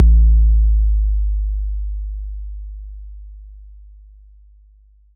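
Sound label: bass drum 808 Tube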